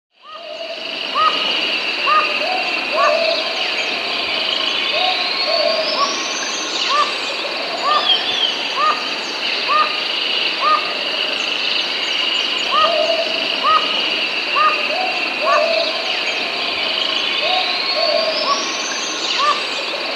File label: Chestnut-Tipped Toucanet, Nature's Songs, S. America